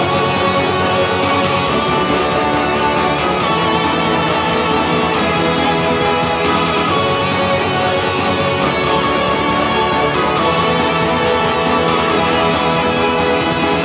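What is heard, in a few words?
Radio music loop; Level 8; X mansion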